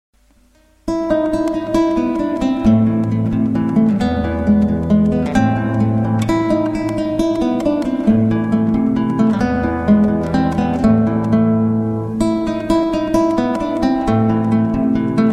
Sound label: Acoustic Symphony